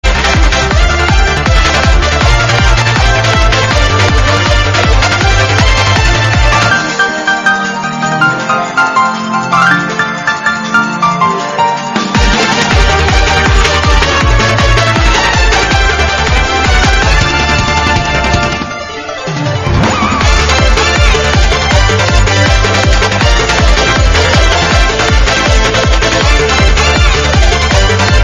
eurobeat; smash bros

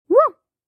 the puppy barks; mimi

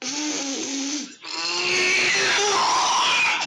lawnmower on a stick; item asylum

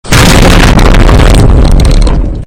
loud dunk

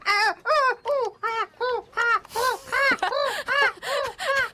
vtuber noise

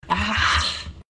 quite ahhhhhhhh

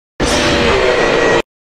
jumpscare; n bonnie

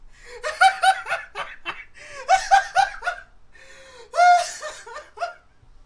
loud laughter

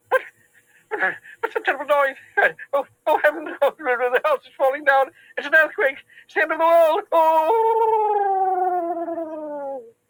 terrible noise; mr jelly

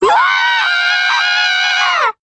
screaming

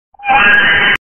creature screem loud

